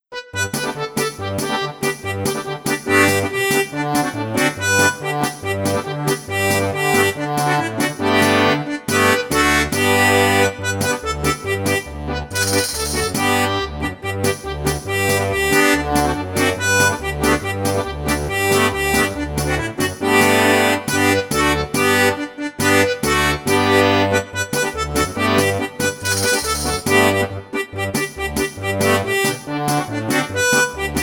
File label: Juanito instr